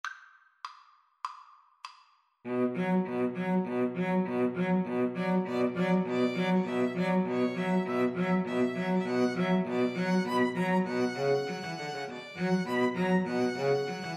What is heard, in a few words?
string trio